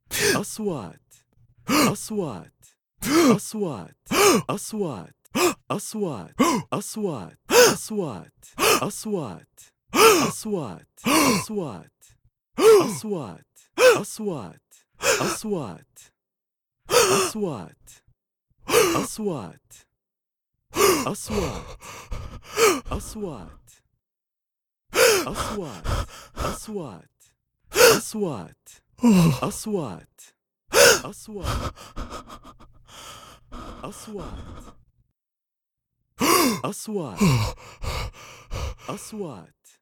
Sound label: MAN PANIC SOUND